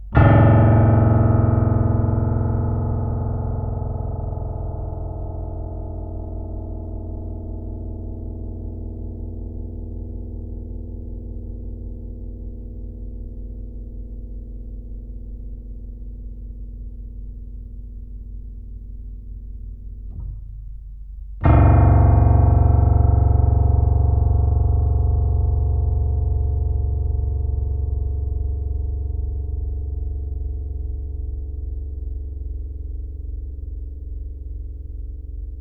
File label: 25Hz-100Hz; TIS Audio Space Reference 2 Ls-35a; Audio Space Unplug